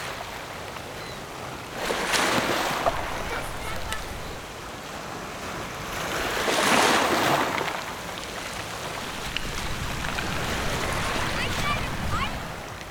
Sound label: ASMR Beaches